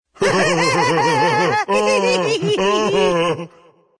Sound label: laugh; dota; ogre magi